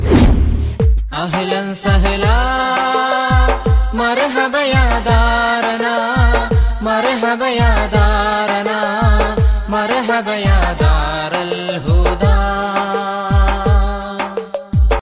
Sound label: Islamic Ring Tone